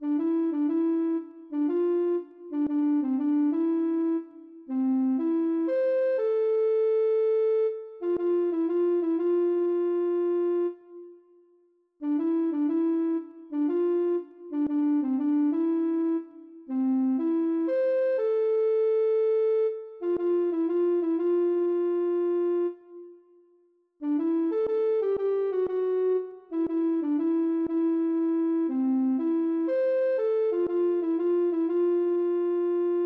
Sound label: ocarina